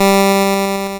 NOTE G3, LSDJ; LIMAT LSDJ kit; LSDJ Notes; Drums

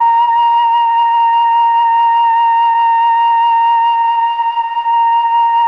MELLOTROF

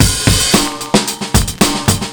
Mondo Bongo 112 bpm, breaks; Zero-G Beneath the Planet of the Breaks

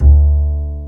UPRIT BS D2, WOOD BASS

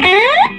Vintage Blues Guitar, SOLO

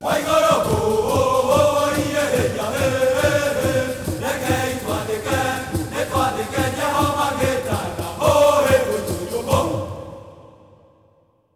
Heart of Africa; Spectrasonics; Heart of Africa 2

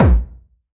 BD, BASS DRUMS; EdgeSounds

